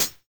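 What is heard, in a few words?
lofi hi hat; Drums In Your FACE!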